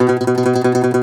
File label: DOMRA, Plucked Strings, Segundo Domra; E-MU Producer Series Vol. 7 – Old World Instruments